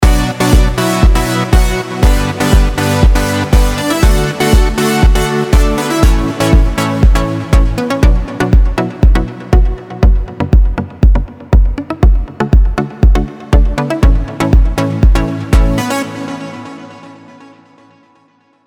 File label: Commercial EDM